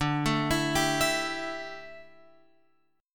D Suspended 2nd Suspended 4th D down Guitar Open F; arpeggiated